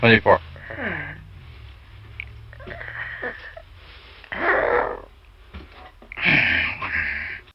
Field Recording Grindcore With My Grandma Doing The Percussion Sounds; Some Subpar Scum Tracks